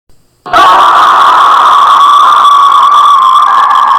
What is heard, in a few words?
scream